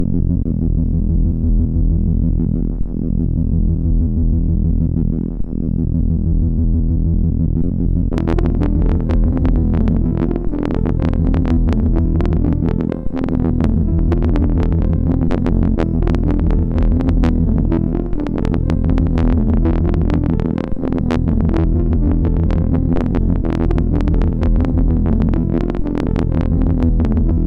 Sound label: Jingle, MOS6581R4; X Jingle